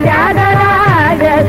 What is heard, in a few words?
Pantuvarali Shruti G